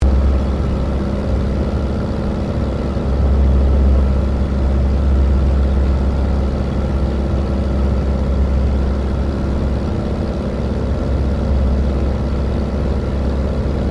AIRCRAFT DH82A DEHAVILAND TIGERMOTH FLYING INTERIOR; RAAF Tigermoth; Airplanes (Sound Effects:Vehicles)